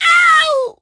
die

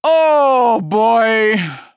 Bombardier death

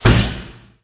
bandit slam

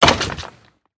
wither skeleton hurt

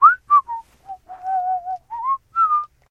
trader whistling; 七日杀; 商人杰恩

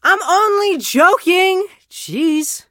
hurt vo

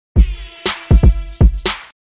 Emote Boombox

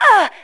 die; 谭雅（红色警戒2）; 盟军

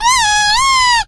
die

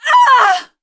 Assassin Hero taunt death

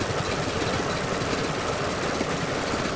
Gyrocopter loop

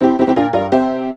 8bit piano lead

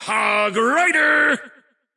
Hog Rider intense

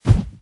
fire spirit step sfx

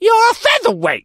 crow hurt

